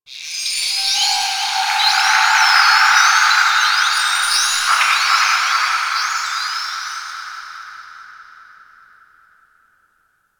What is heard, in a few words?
Ocean Dolphin High; Warframe; Grineer Ocean